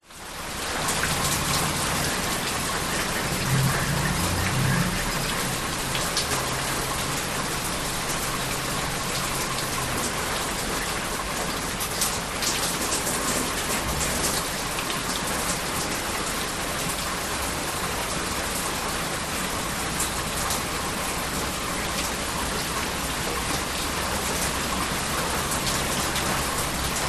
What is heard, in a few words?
nature rain light back yard suburban light passing traffic in distance brids